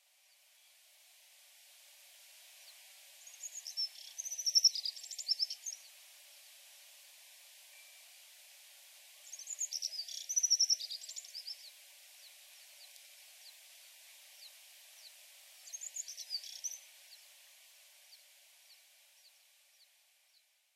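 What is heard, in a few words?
Alpine Accentor; iwahibari; norikura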